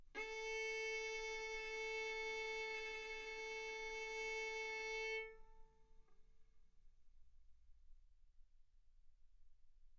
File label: Violin arco mf sul A A4 B4 stereo